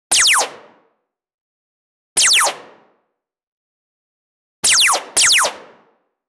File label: short retro pistol shot; shooter